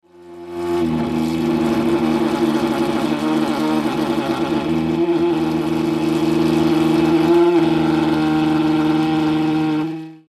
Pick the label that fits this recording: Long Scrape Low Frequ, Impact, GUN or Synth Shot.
Long Scrape Low Frequ